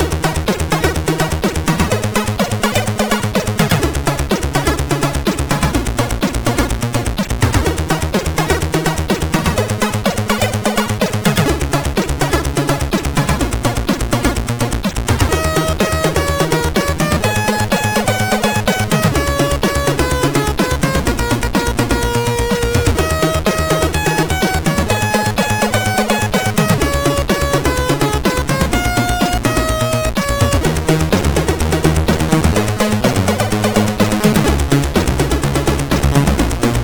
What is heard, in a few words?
Hip Hop, sid, CSG8580